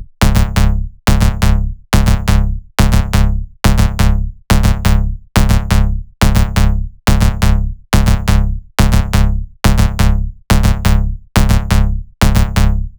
140BPM C#; Vengeance - Essential Clubsounds Vol.4

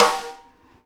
Live Snares; E-MU Producer Series Vol. 8 – Platinum Phatt

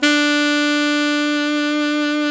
SAX ALTO MF; Alto 414

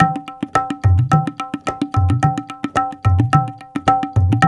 Tablas

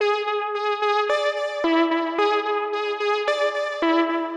Synth 110BPM

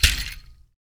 HAND, Afro Shakers, Ankle Pods

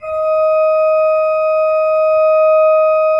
D4, Cathedral Organ; Propeller Island

